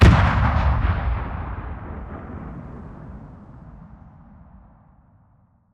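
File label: Reaper Nuke Destruct Explosion Distant; Titanfall 2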